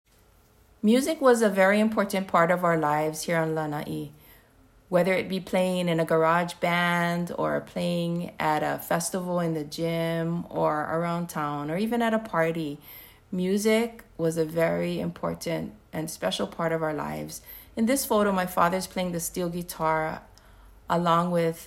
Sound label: Music; plantation life on lanai